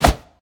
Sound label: whoosh; smite; god raijin